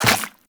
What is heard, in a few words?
Run Goo, Footsteps; Warframe; Tenno